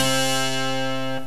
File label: spinett; Terra Sound Library; construction set